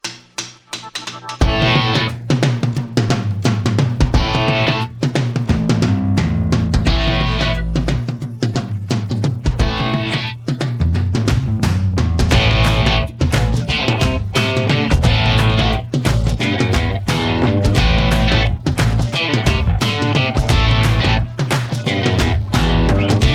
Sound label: Instrumental